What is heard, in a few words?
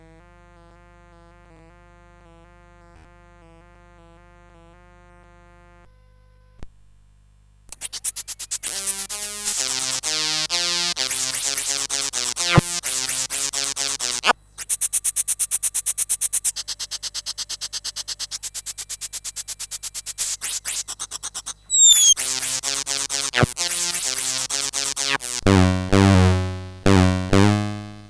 Scratch II, CSG8580R5